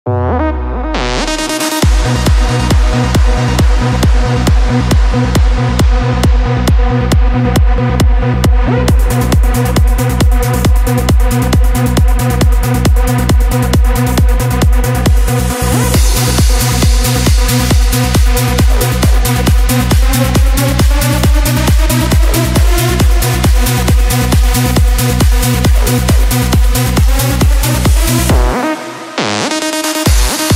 uplifting trance remix

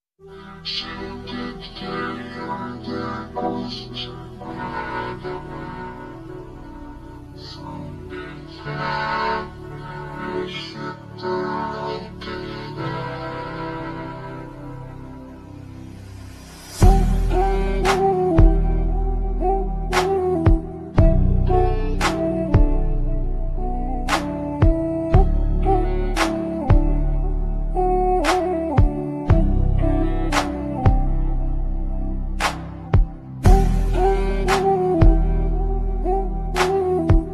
slowed and reverb; sad

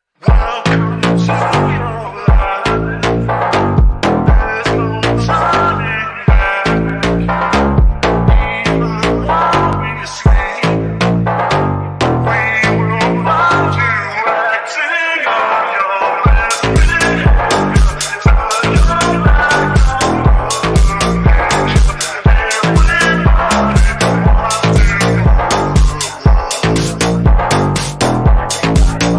Brazilian phonk; speakerman; skibidi toilet

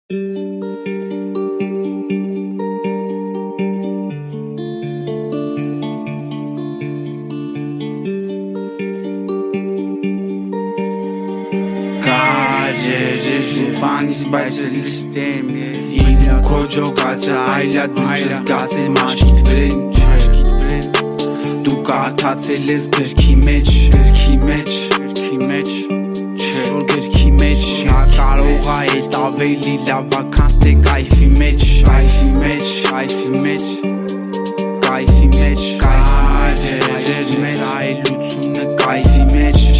new armenian rap

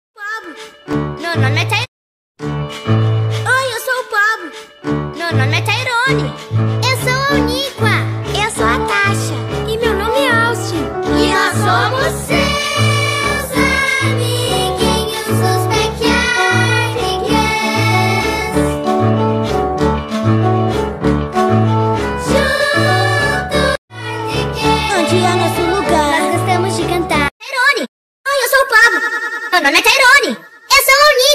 brazilian phonk; the backyardigans

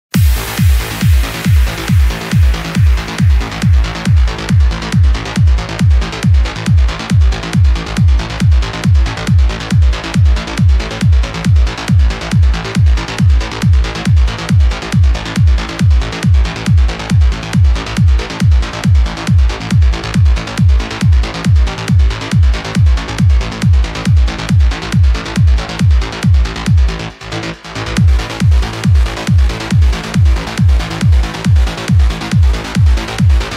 trance remix

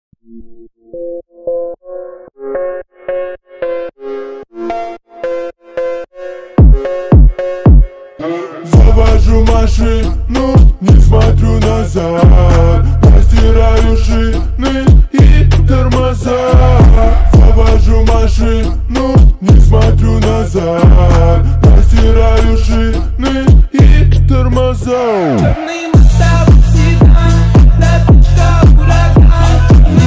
bass

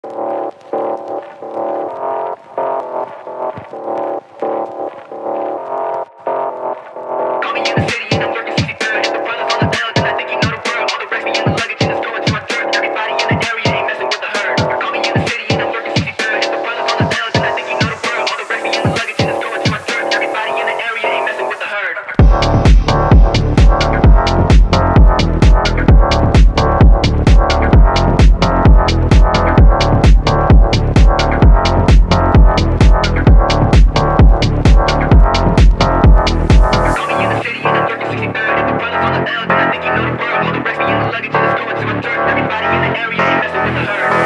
lofi

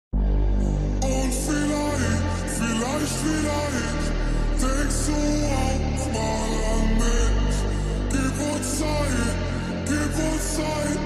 hardtekk remix slowed

